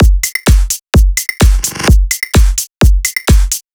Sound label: drum loop 128 Full; Electro; drum loops; Anthemic